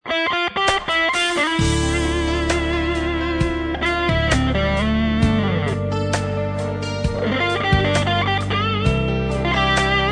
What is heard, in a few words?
rock song; Tonerider